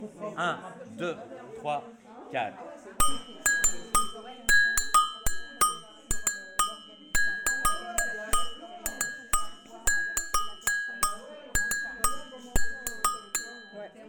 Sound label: agogo, samba reggae